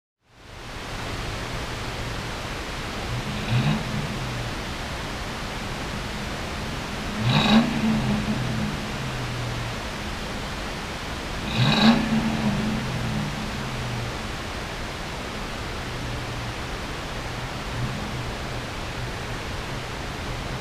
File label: M80 Sound